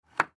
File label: Coaster Pick Up, Coaster Pick Up Scrape Wood Table; Miscellaneous Bar; Household; Blastwave